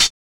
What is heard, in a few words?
Closed Hats